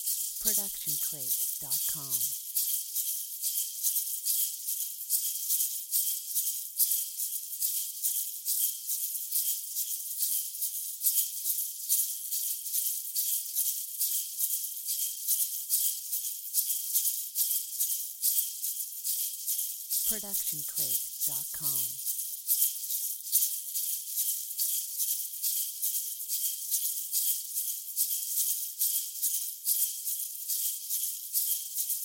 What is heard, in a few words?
egg shaker fast; SoundsCrate